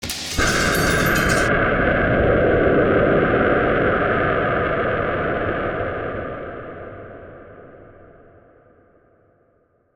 rapid depressurization

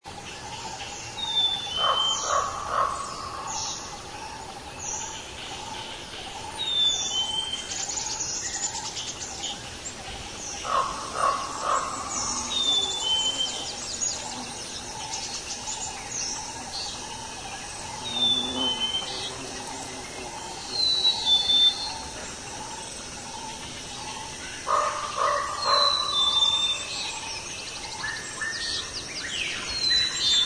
Forest Deep; fa battlemaps

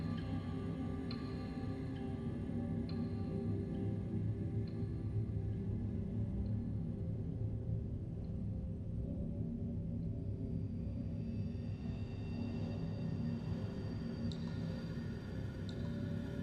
citadel catacombs, ambience; pf2e; year of battles spark

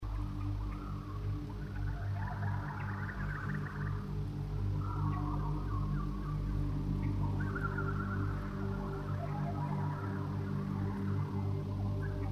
Greenleaf Forest House; pf2e; the seventh arch

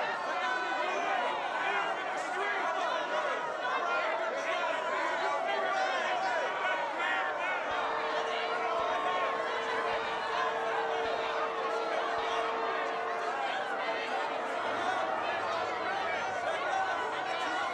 unruly crowd

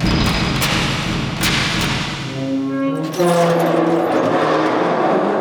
Safe Door Open; fa battlemaps

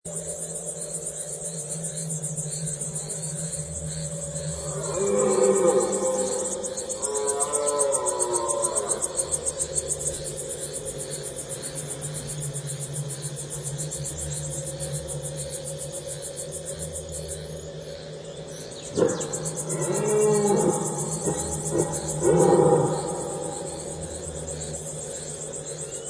Forest Deep Night; fa battlemaps

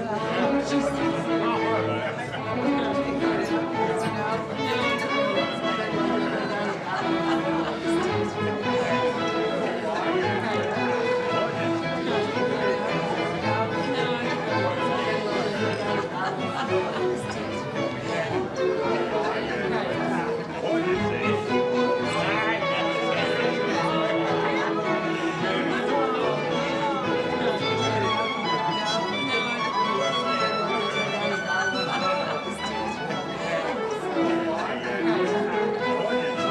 gala, ambience; pf2e; hellbreakers

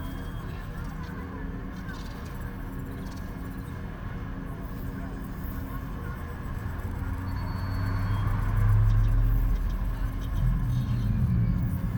halls of the living, ambience; sf2e; guilt of the grave world

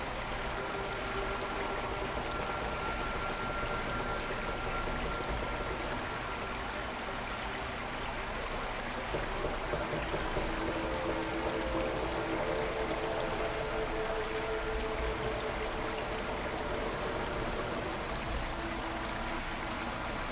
the clockwork temple, ambience

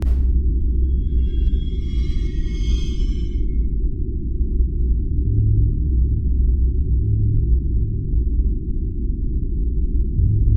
ambience; sf2e guilt of the grave world

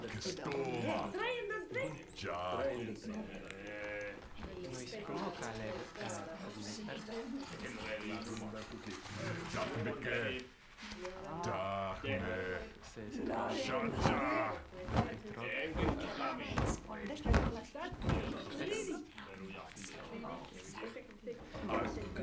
headquarters, ambience; pf2e; hellbreakers

